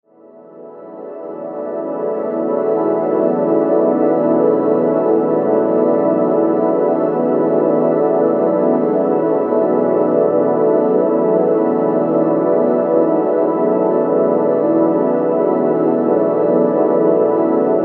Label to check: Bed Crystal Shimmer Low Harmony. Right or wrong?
Right